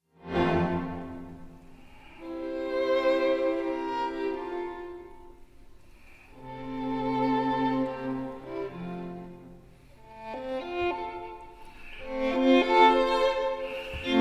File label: string quartet in d minor; wolfgang amadeus mozart